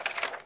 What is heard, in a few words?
page turn